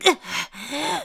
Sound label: Breathe; Link breathing heavily (arrived in Lorule); zelda